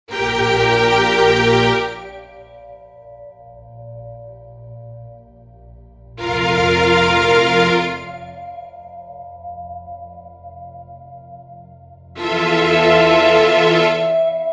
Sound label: String Ensemble; Native Instruments Symphony Series String Ensemble